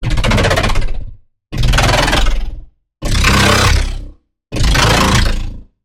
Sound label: Growl; Allosaurus; Routed Sound; Vital Expansion - Chaos Bringer Vol.1